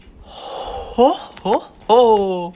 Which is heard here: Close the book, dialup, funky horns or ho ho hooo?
ho ho hooo